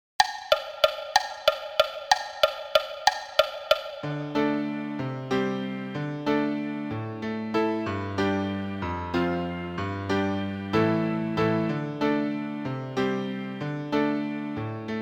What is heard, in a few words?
Celtic Round Dance count off